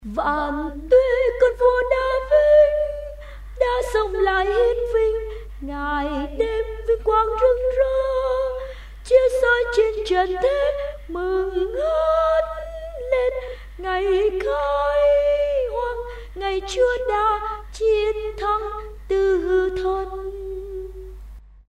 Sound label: sop tenor